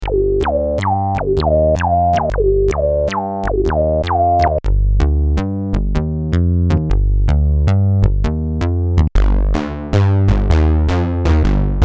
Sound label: Basses Seven, Prophet '08